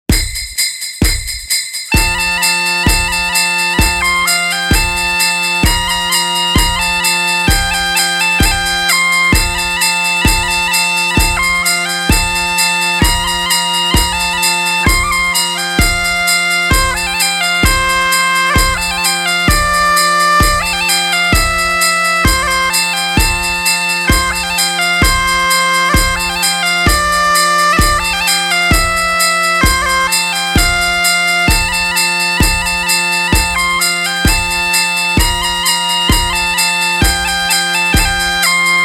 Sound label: Jingle Bells bagpipe solo